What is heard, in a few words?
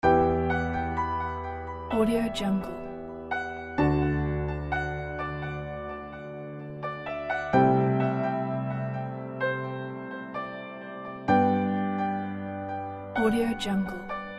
piano memories; gabrielgaro